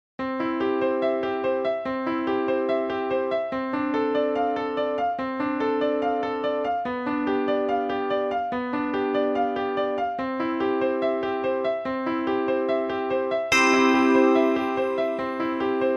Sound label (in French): pour clochettes anglais et piano; gounod charles; ave maria base sur un prelude de j s bach